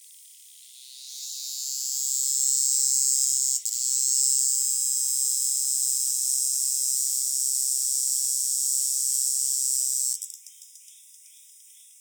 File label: Diceroprocta cinctifera filtered, cicadas